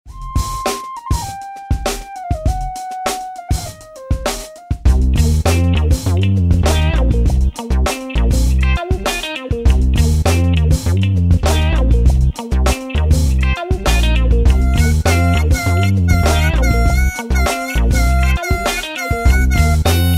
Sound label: Funk